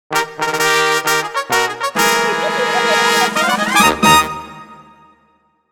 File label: Rocket Perfection Fanfare; Yokai Watch original soundtrack; TV anime; game Yokai Watch Blasters